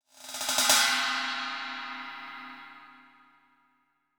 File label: ride